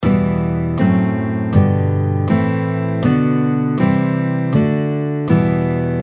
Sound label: E minor Chord Scale, guitar